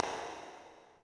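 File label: echo run, footsteps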